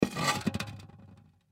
Serving Tray Down, Serving Tray Set Down Table Empty; Miscellaneous Bar; Foley; Blastwave